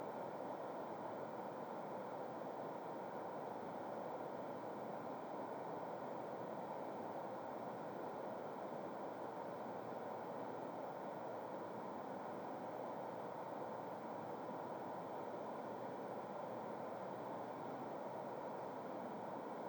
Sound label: wind loop